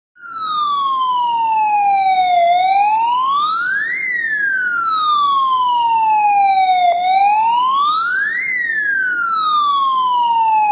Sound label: Fire